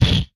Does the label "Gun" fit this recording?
Yes